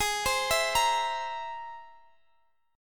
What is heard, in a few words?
C Augmented 7th Ab